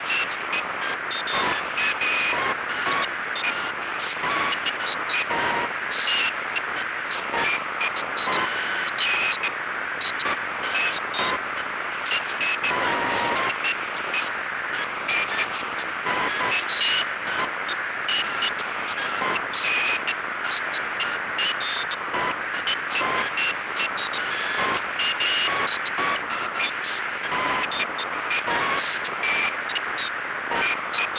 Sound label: broken machine noise synth loop